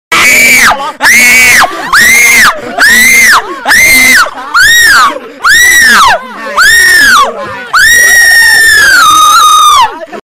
tieng het

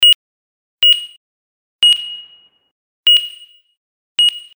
Tieng may quet ma vach

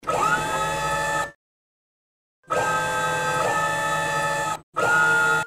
Tieng robot hoat dong di chuyen; Tiếng Robot hoạt động, di chuyển

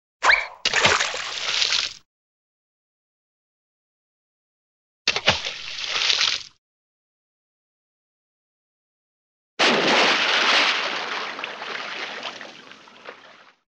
Tieng roi xuong nuoc nhay xuong nuoc

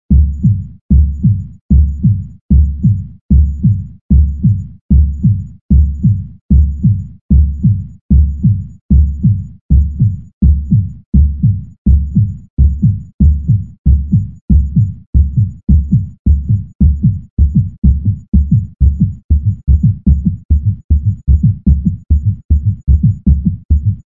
Am thanh hoi hop

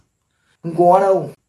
am thanh khong co dau tien bip

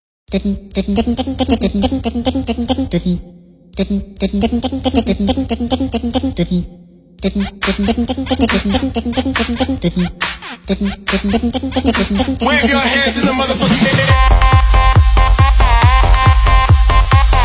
Nhạc chuông iphone chế

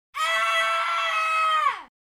tieng het lon cua mot dua tre

